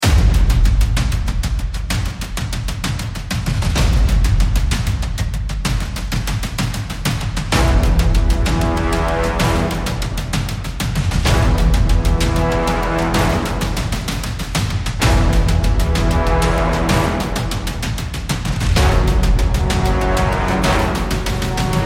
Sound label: brass riot